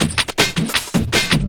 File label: Drum & Bass, 160 BPM